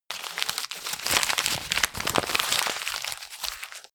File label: paper scrunch; industrie-wirtschaft; bueroarbeit